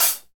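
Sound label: HH, hi-hat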